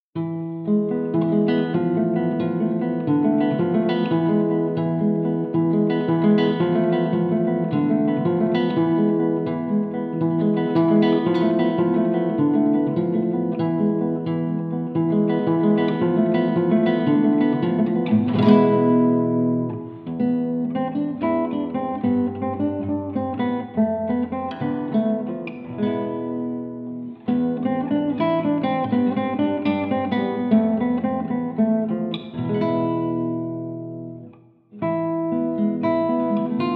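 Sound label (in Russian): Klassicheskaya gitara, classical guitar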